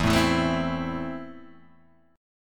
A Minor E

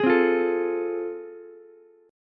A Minor Major 7th Sharp 5th, Ukulele; strummed; AmM7#5; JGuitar

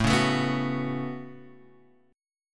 C sharp 7th Suspended 4th Sharp 5th, A

